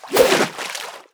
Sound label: BOSS Atk